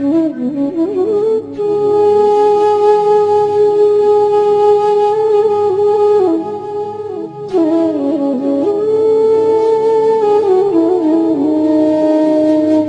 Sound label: Saddest Flute